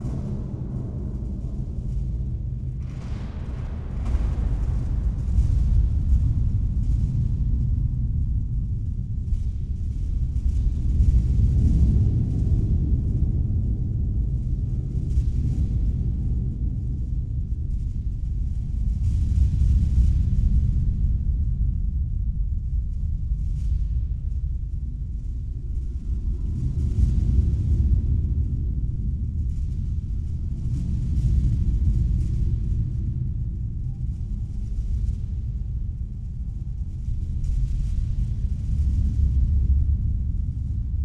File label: ambience; apartament 1406